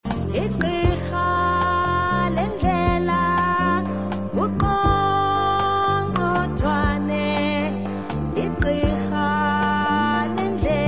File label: Click Song